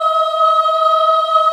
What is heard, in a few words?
D#4 CHI OH-R, Choir; USB Soundscan vol.28; Acoustic & Synth; AKAI